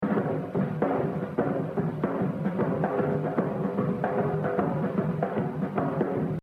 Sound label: Exercise, jazz drumming; Exercise 16 Gene Krupa - 82%; The Evolution of Jazz Drumming (Gottlieb)